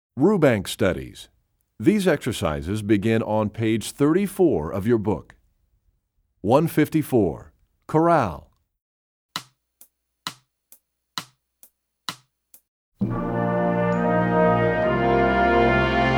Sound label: Chorale, Percussion; Rubank Studies; EE2000 Book2